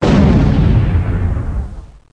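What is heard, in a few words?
explosion, surface; PaxImp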